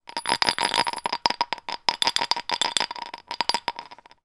foley decoration bauble broken shake rattle; zapsplat

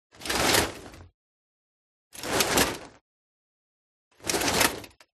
ARMOR Plate Armor Movement Rustle; FOLEY; smartsound

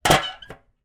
household garbage can metal lid close

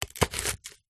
food onion raw skin on slice chop knife

